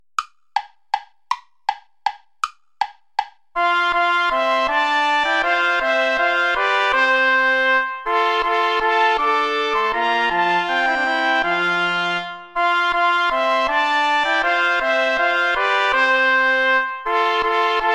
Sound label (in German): lobt gott den herrn ihr heiden all play along without trumpet; vulpius melchior